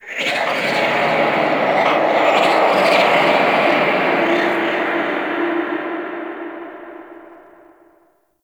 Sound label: Moon Whispers Medium; Warframe; Orokin Moon; Ambience